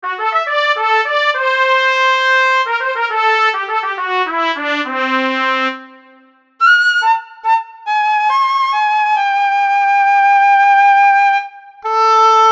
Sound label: Advanced Orchestra; Pikmin2